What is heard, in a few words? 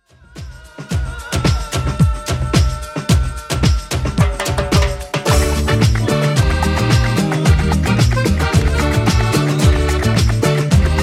90s Perc 110bpm